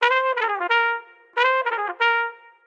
Solo Trumpet in F 90bpm

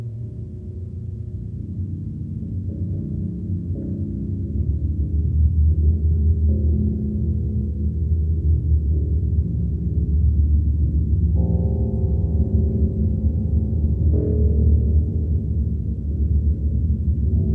Rumble Notes

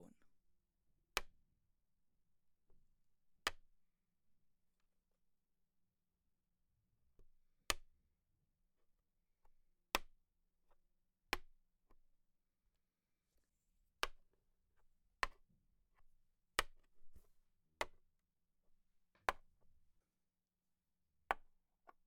Placing Chess Pieces On Chess Board

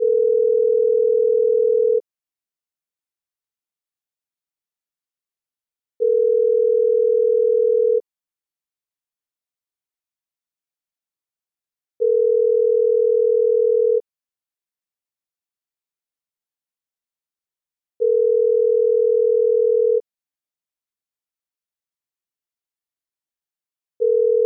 Ring Tone

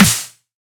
Nighthawk Snare

